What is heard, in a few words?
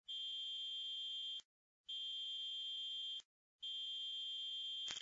hi freq